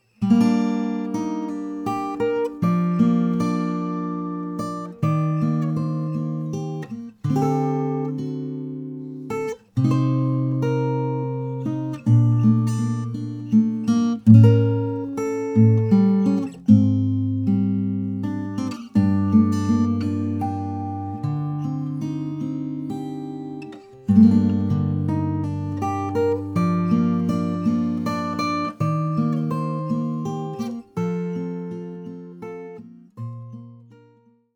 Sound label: dry acg; atm450